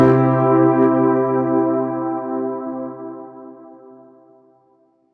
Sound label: victory